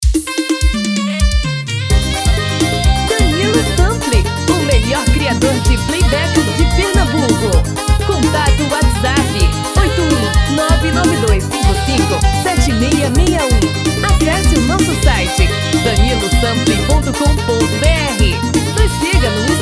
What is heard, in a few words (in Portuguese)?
Forro das Antigas